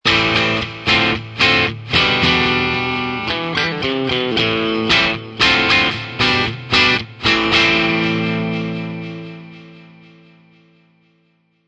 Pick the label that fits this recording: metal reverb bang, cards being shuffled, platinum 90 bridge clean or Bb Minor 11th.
platinum 90 bridge clean